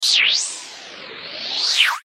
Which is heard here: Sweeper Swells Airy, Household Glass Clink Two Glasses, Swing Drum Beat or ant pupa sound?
Sweeper Swells Airy